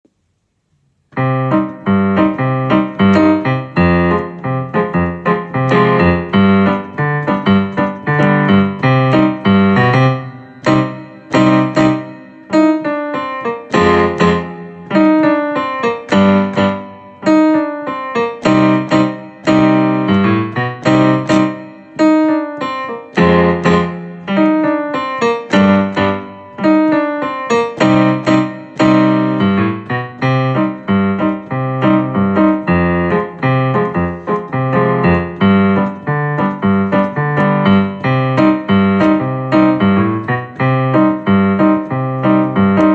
Clarinet accompaniment

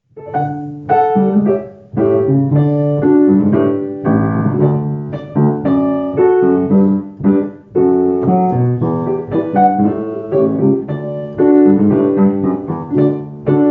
Old MacDonald accompaniment

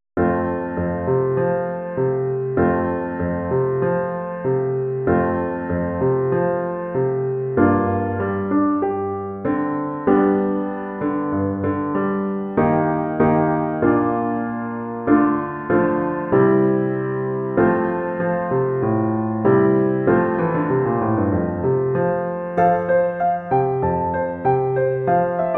Goe from my Window piano only

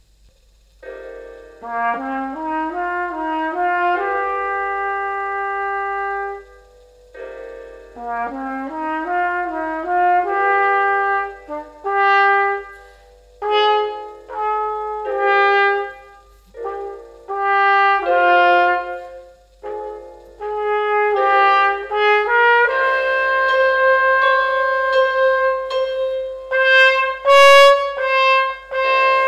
Trumpet

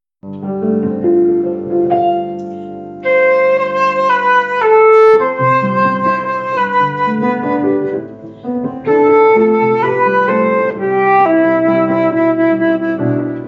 with Solo

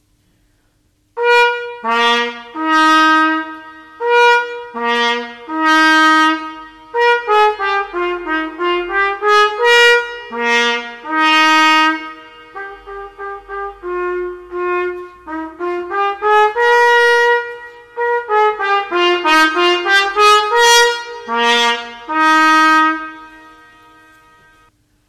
Hot Cross Buns Trumpet

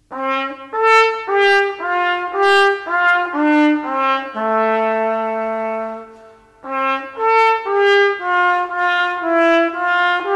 Trumpet; My Bonnie Lies Over The Ocean

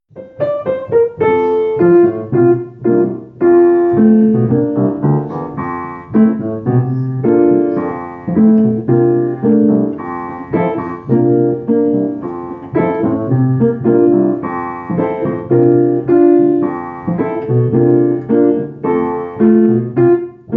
accompaniment

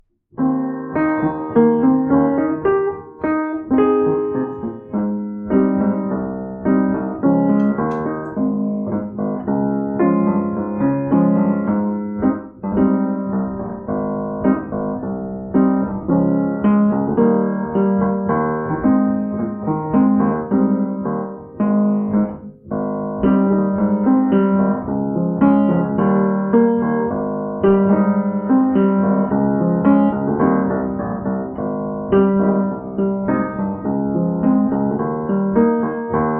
Sax piano

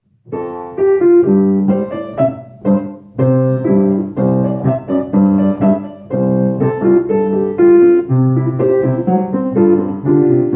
accompaniment

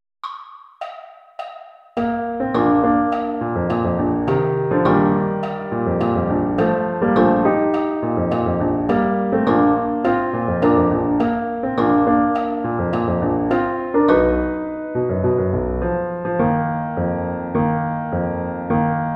piano only

